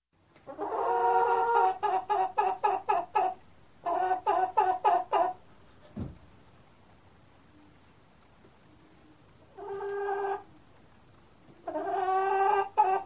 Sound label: Chicken Cluck; Sound Ideas; Series 2000